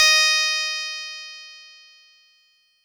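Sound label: Fuzz Clav DX100 D#0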